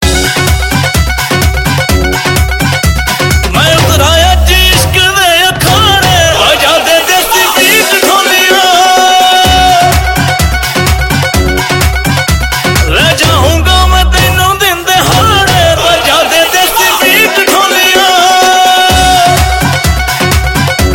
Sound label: desi beat ringtone; Indian